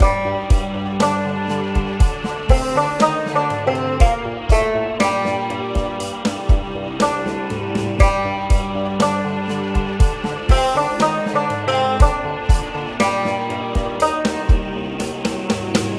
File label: Tension Loop 120bpm 8bar